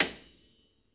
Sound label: piano F6, Classical Piano; Classical Piano mp -ped; Best Service ProSamples vol.53 - Classical Prepared Pianos; Total Piano; Brilliand Classical Piano